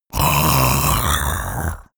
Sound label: monster choking zombie; koerpergeraeusche; comedy-cartoon; soundpool